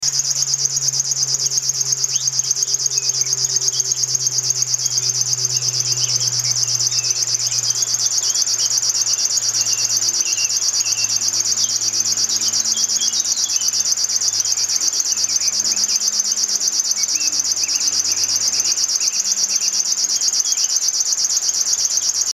schlagschwirl

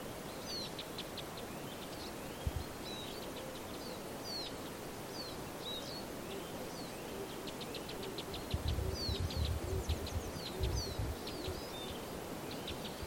bindenkreuzschnabel

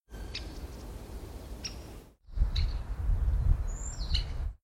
blutspecht

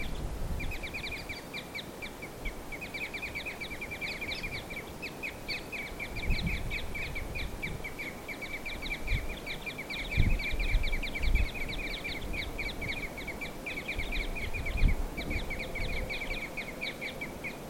fichtenkreuzschnabel

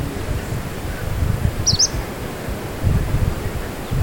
tienshanlaubsaenger

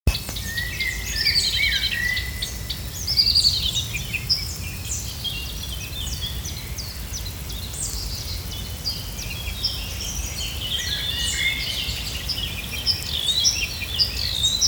buschrohrsaenger

